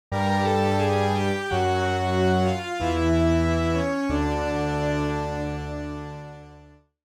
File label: Game over you lose futuristic sci fi